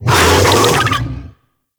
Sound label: Infested Big Ashen Vocal Attack; Warframe